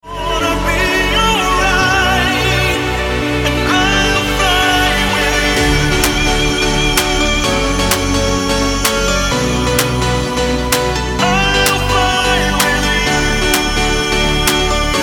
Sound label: Melodic Techno